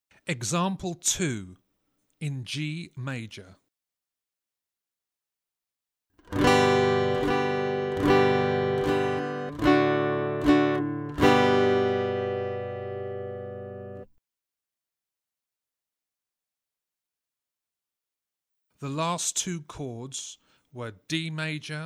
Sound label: Aural Harmony Test, acoustic guitar; Grade 4